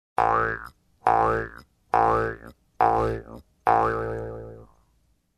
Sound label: Comedy frog jumping sound